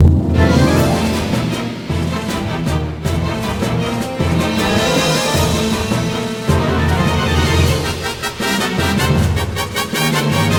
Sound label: noise, lolicore